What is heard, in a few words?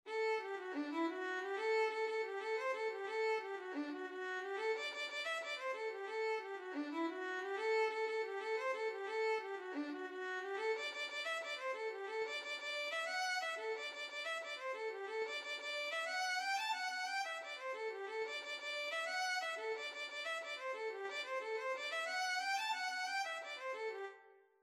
violin